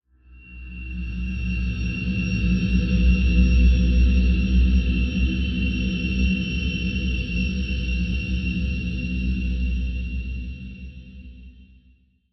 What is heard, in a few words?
Swell Tension Drone Creepy, sound design; Swells